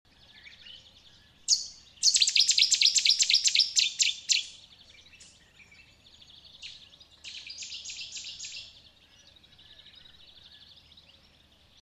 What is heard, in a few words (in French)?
bouscarle de cetti